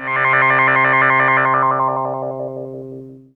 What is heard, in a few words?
EFFECT, Explosive Jungle; EFFECT 1; USB Soundscan vol.05; AKAI